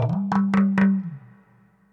PERC LOOP ETHNO 125; eJay

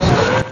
mec step

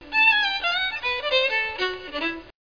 fancy fiddle

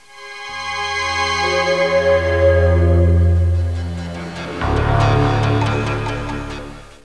nite

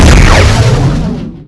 arm Blaster; PC Gamer IT CD 48; Big bang

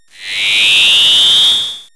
Fade; Treasure Galaxy (1994); Learning Company

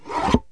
paper cut; OFFICE

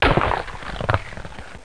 hd crush; duke nukem total meltdown

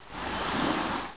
water; Sun on Ocean; camtasia; Menu Maker

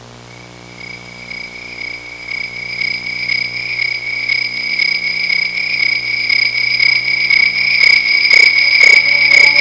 synthetic fireflies

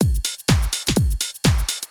drum loop house 125; eJay

